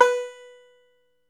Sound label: ARCHTOP, Arch Top Banjo